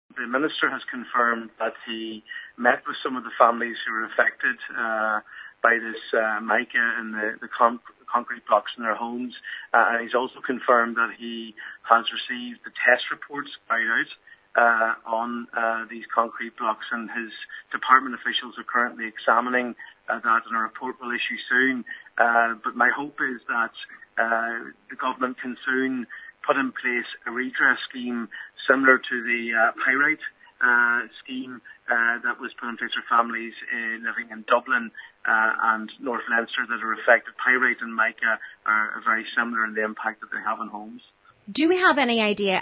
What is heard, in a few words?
pad